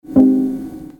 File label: Alert